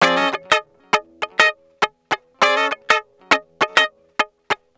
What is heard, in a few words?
Guitar Loop; Ambient Grooves